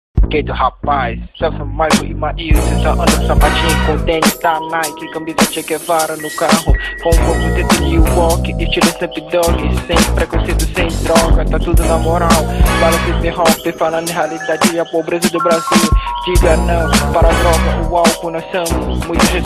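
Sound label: hip hop freestyle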